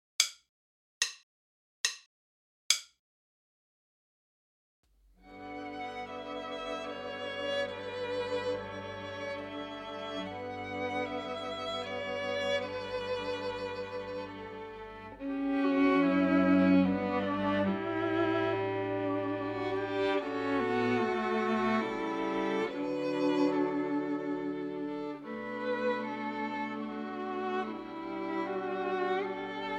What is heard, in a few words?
minus Cello